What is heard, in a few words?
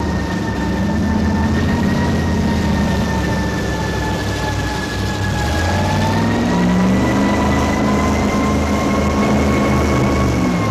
Motorboat Whine; engines